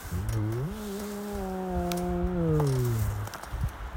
Tiger

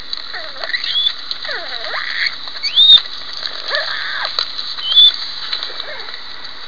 cavy

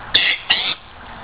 golden pheasant

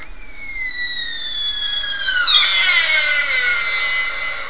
sika buck

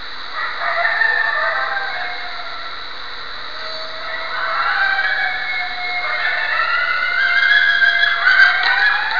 wolves

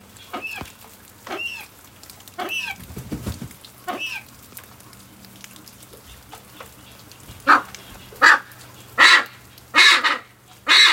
Blue Eared Pheasant

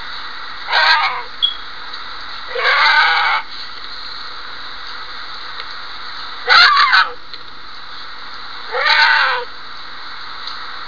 cougar crys

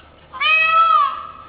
Peacock

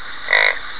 young buffalo

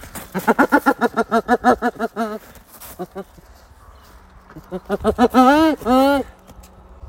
Hyena laugh

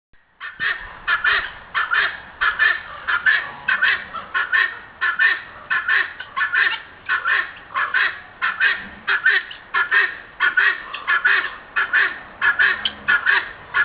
Guinea

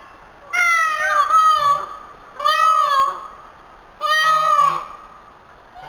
peacock sound

